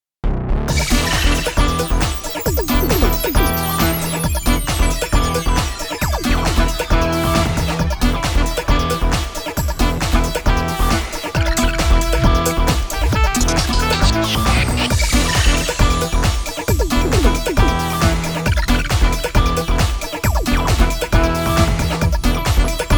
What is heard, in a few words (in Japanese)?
シーンミュージック; 水面の雫は潮の味